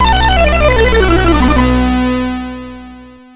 sid CSG8580R5; hvsc

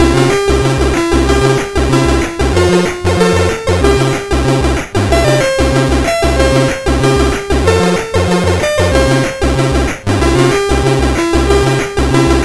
sid CSG8580R5; hvsc

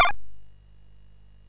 sid MOS6581R2; Gryzor; hvsc

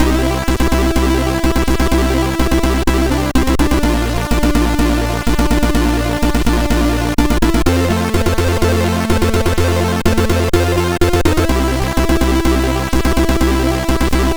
sid MOS6581R4; hvsc

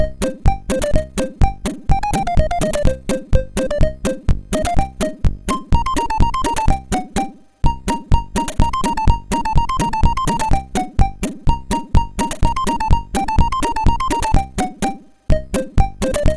Angry Birds Short Sample, MOS6581R4; hvsc; sid

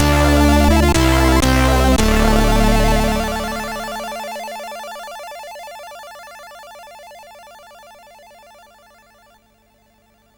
Metal, sid MOS6581R4; hvsc

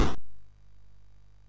sid MOS6581R3; hvsc

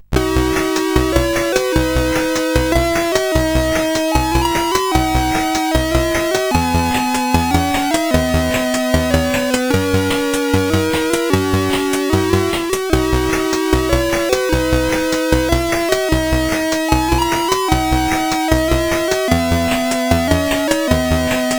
sid CSG8580R5; Gryzor; hvsc

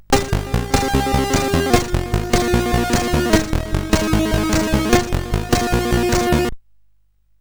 sid CSG8580R5; hvsc

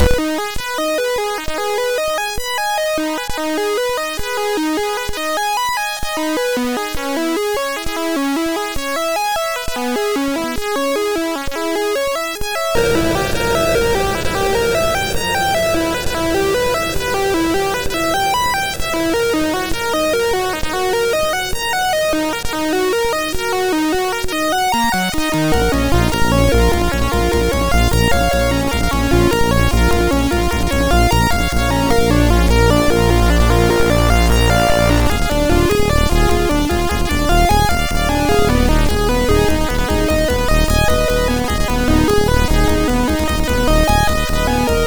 Crack Intro, MOS6581R2; hvsc; sid

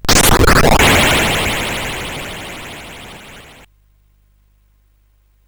sid CSG8580R5; hvsc

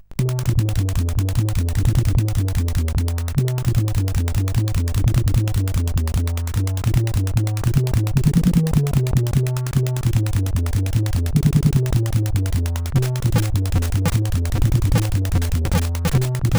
Drum n Bass, sid CSG8580R5; hvsc

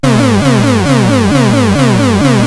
sid, 8580; Die Alien Slime; CSG8580R5

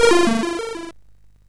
sid MOS6581R2; hvsc

